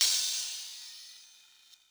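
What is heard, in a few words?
open hi-hat; eddyflux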